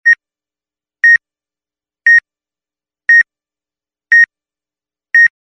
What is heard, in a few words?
alarms beep heart monitor